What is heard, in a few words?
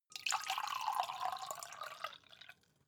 mug pour; soundpool